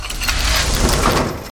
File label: item reveal